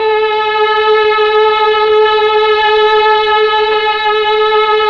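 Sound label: Mellotron Strings A4, Tron Strings; Keyboards of The 60's and 70's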